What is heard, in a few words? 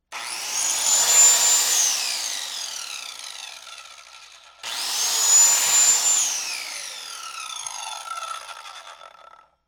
power saw